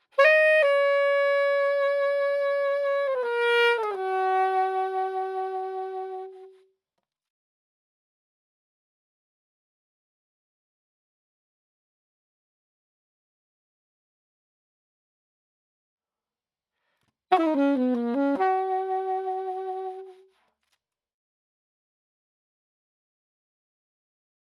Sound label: Sax